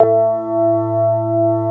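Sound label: CX3 organ A2 L, Keyboards Old School; USB Soundscan vol.09 - Keyboards Old School; AKAI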